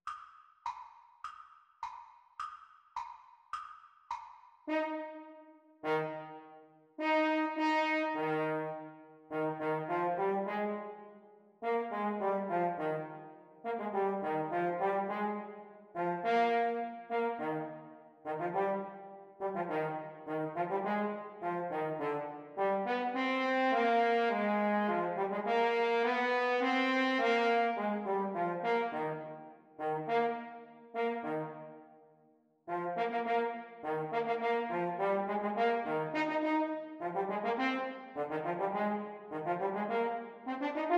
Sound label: French Horn Duets Playalong